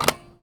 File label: Foley